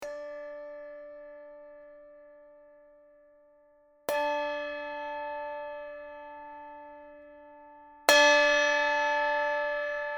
Handbells velocity, Handbell Multi-velocity Instrument; three velocity ranges: / • 0 – 70 (soft) / • 71 – 109 (medium) / • 110 – 127 (hard); In this way, the velocity triggers a sample that corresponds to both volume and timbre